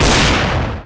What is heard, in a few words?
awp, gunsound; cs16; cstrike; weapons